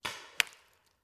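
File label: Paintball Gun Impact; Sound Ideas; Guns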